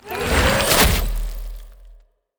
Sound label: heroes lumenir death